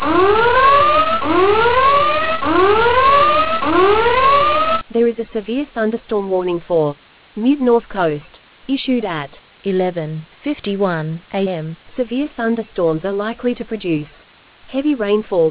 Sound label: storm audio